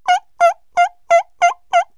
screw, cartoon

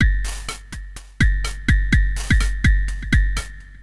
XTRA HOUSE 125

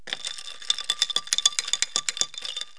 Stir Drink in Tumblers; KABOOM! - Wild and Wacky Sound Software; Household; Foods

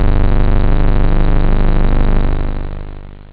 terror, synth

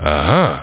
Ahah; Duke Nukem 3D Kill-A-Ton Collection

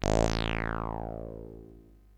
korg, Synth

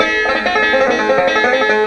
shanty

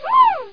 woooo

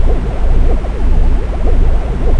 acid amg; Total Chaos Nukem 3d Pack; duke

